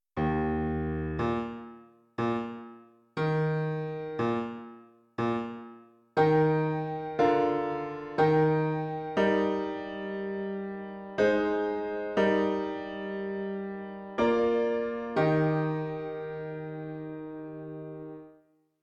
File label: Piano